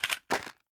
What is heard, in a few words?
Match Box Drop; The Town